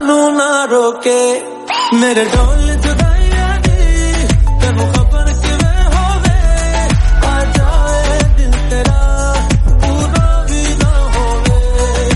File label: remix